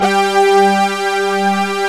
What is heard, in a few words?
PERC 5ths